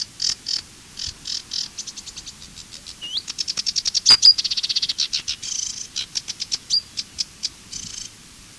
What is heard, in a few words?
sedge warbler